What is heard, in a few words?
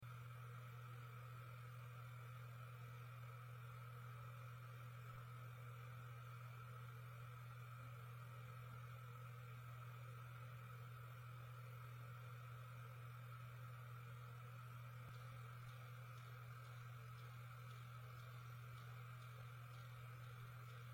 samsung spinpoint f1